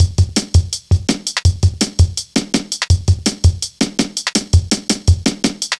Foot Tapz, Drum Loops 165.5; Zero-G Jungle Warfare 1, 2 & 3

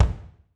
BD, Drums; Bob Clearmountain Drums I

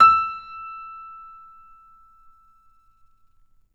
PNO, KEYS; Synclavier Sampler Library

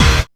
GUITAR HIT; Back In Time Records; Big Bang; THE ROCK

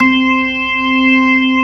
ORG, Organs

